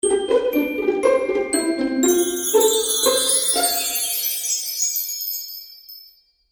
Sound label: Magical Music Finale, Cartoon Music Sound Effects